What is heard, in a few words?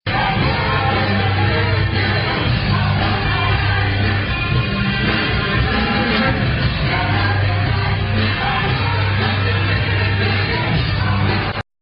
crowd dance; Fursuit; FurryCon; Anthrocon; Kevin Kelm Lighting Effects